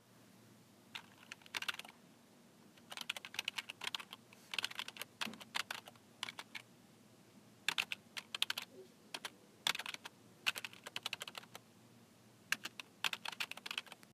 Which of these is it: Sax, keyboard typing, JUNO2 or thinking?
keyboard typing